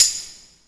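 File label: new age tambourine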